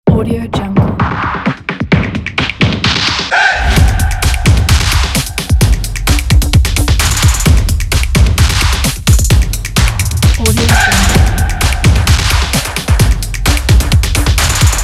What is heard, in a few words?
techno stomping; fassounds